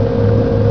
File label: generator; XDM; ambience